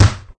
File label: thud